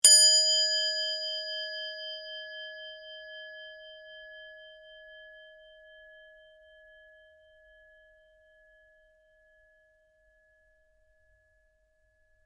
Budda small bell; jidaigeki

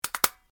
stapler

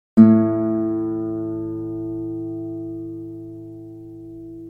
guitar

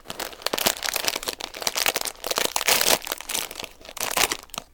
opening a crisps bag; eating

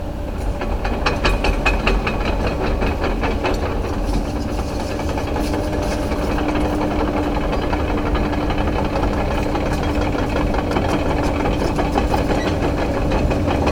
caterpillar

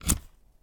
unplug USB

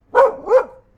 dog

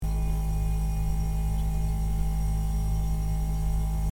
refrigerator; cooking

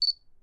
focus camera

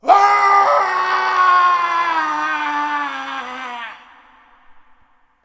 samurai shouting

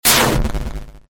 game explosion; arms

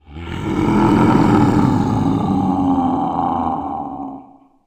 monster roaning

